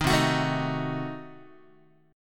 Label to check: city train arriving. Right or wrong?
Wrong